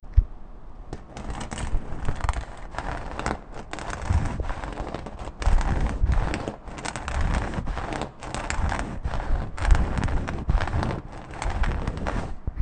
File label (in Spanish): Mesa De Metal